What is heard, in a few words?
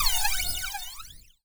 Double flange, Synth